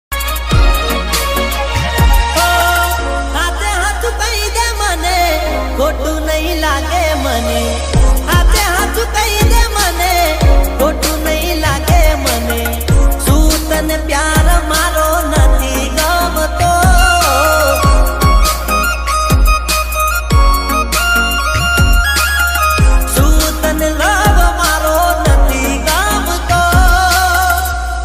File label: love ringtone gujarati new song gujarati ringtone gujarati instrument ringtone